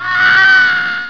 SCREAM

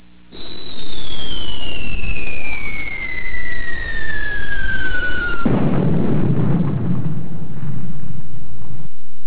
shell

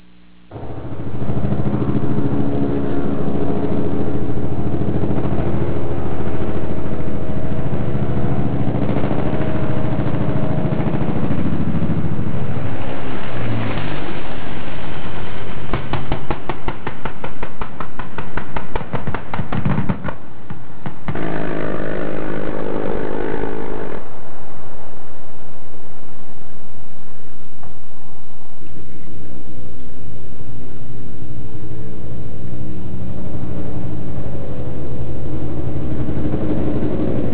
heli

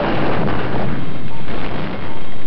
EXPLODE

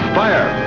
FIRE

